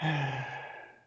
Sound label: disappoint